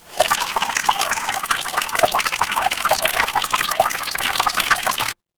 water stream

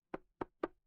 impact wood knock

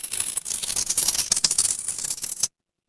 magic scifi portal

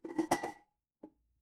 glass ceramic

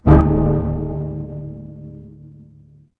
instrument bell

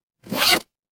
paper fabric zipper

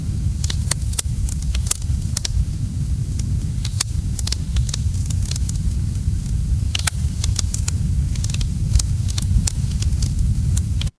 ambience fireplace

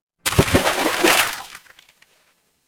water splash

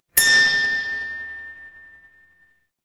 instrument bell

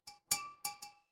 glass glass tap

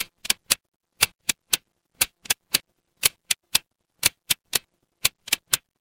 mechanical gear

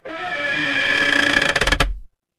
door creak

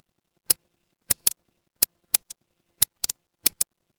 office pen

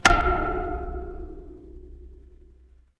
instrument wood block

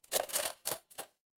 office cash register

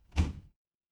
door close

